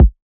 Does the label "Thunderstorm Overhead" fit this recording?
No